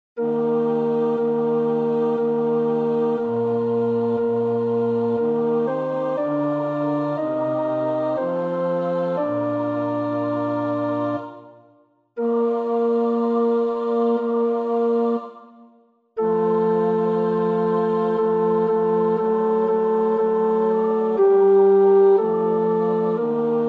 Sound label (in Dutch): tenor; Purcell (Henry); Funeral Music for Queen Mary, Z 860